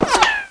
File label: Chain Gun Ricochet; Defiance